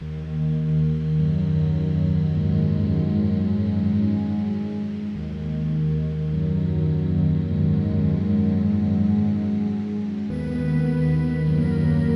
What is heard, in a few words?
nuclear cave; Hazmat Suit; Radiation